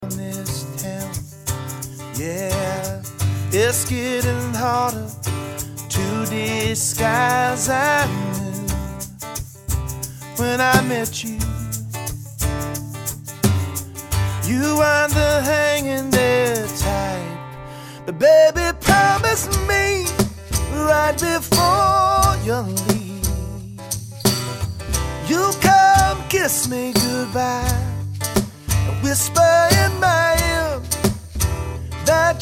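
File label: Mid Tempo Perc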